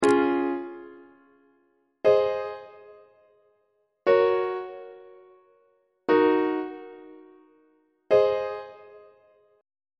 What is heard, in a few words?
d flat major half cadence on treble clef